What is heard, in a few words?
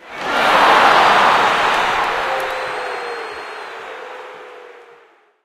bumper car boo; Source Engine; tf